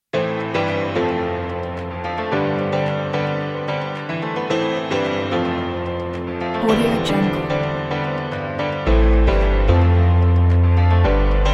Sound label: uplifting energetic motivational pop